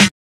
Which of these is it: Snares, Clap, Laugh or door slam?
Snares